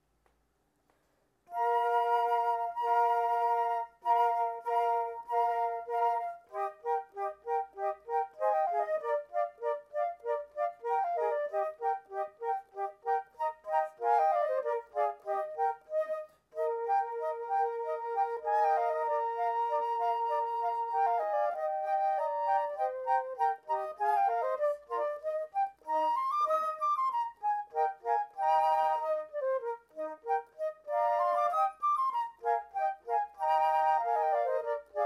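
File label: trio with percussion; the galway piper; toe ensembles bk 2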